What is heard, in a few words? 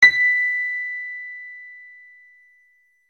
PIANO; pictcode